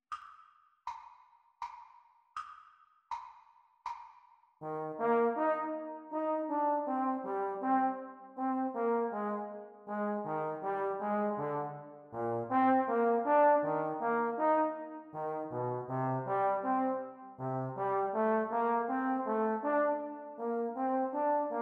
Playalong for Eb Horn Exam Speed

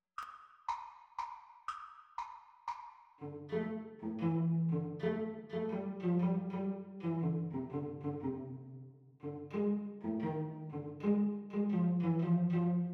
Cello Duet Playalong; Exam Speed; The Teacher part

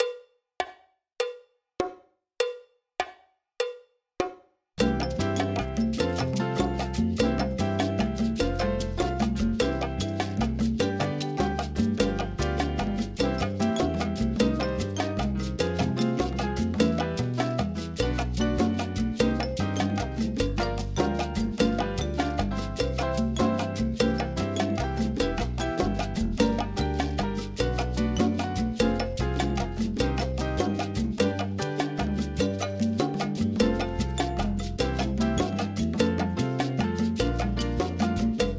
Drum Kit Playalong; Grade Two; backing tracks; Drums

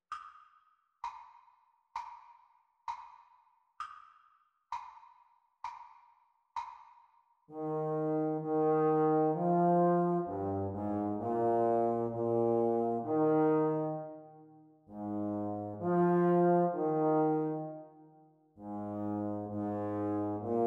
Playalong for Baritone Euphonium Practice Speed

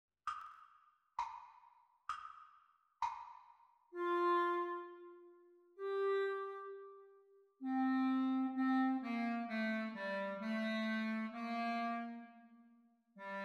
Practice speed Recording Clarinet Duets

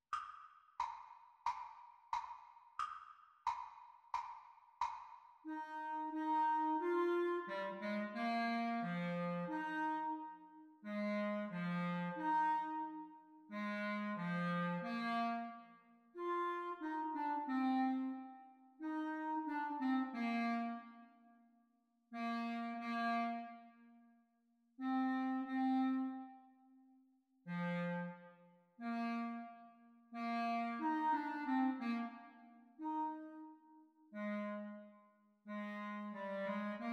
Exam Recording Clarinet Duets; Exam Speed; Grade 4; The Teacher part

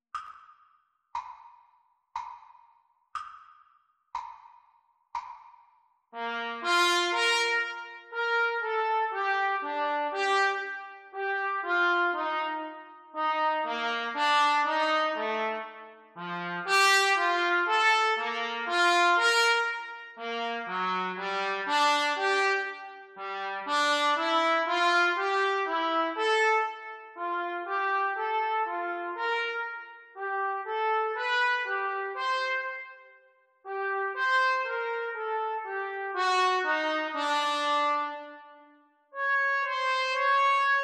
Trumpet Duets Practice Speed; Grade 8

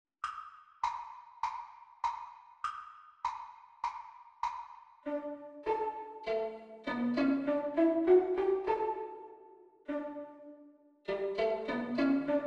Violin Duet Cup Final; Exam Speed

